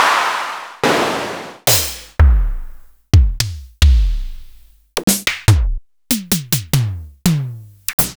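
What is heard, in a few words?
Roland MV8800 drum ANALOG; ANALOG CRAZE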